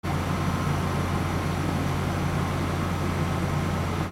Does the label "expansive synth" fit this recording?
No